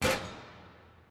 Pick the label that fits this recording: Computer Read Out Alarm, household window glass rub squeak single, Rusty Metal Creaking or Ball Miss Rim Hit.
Ball Miss Rim Hit